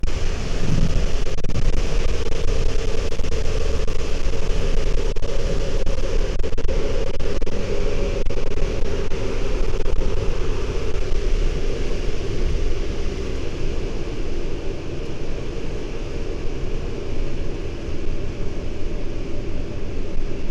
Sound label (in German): In Lisabon auf der Strasse; Fieldrecordings; Cafe in Lissabon